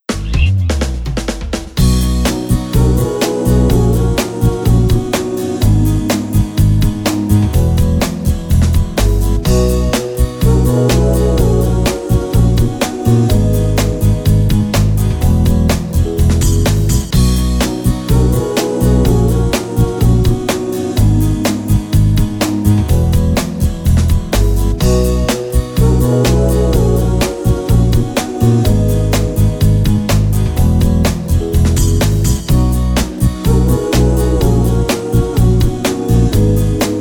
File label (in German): telefonansagen musik country